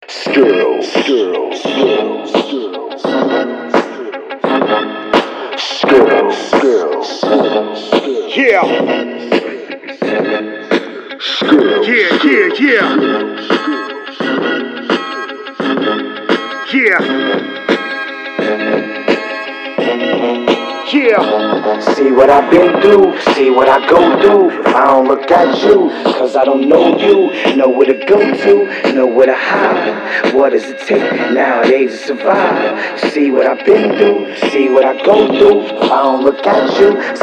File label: radio music